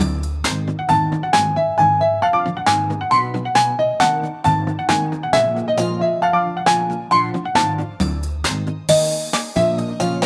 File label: energetic cute cinematic, adventure